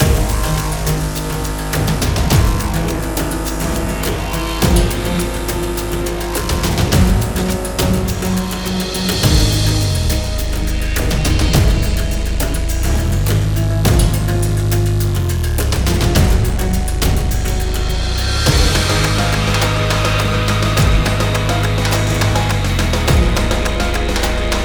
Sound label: Clock; honkai impact 3rd yesterday original soundtrack